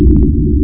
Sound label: fighter exp; Taifun 234; AsteroidsII